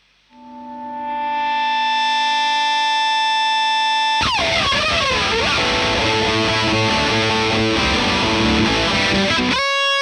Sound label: Marshal Gtr Line